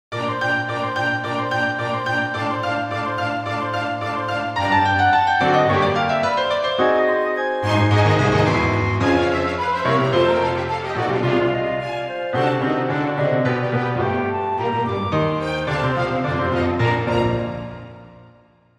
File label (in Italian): piano ritmico